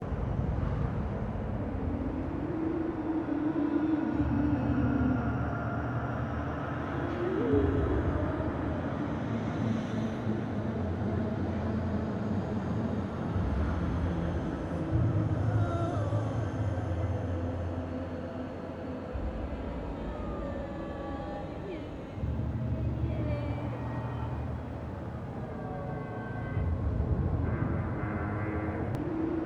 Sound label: BGM Environment Building; God Eater Resurrection